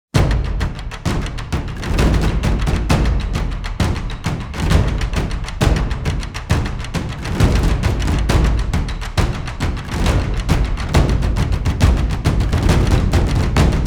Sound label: Drums; Arcane